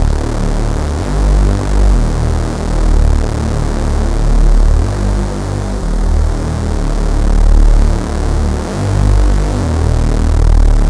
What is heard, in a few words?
Dirty Reese